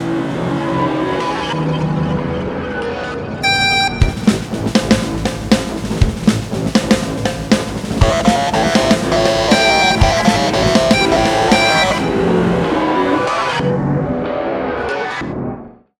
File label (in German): Impro Jazz Avantgardistisch